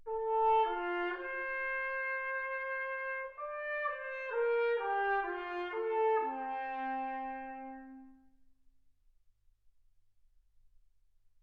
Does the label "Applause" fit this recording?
No